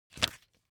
paper magazine flip forward fast; foley; smartsoundfx

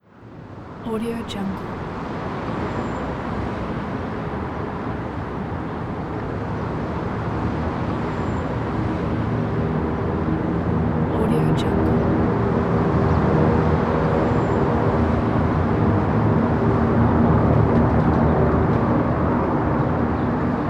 city ambience with helicopter

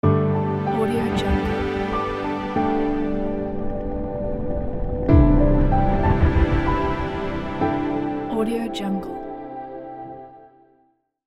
elegant butterfly ident

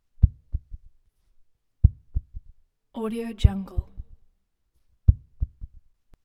heartbeat

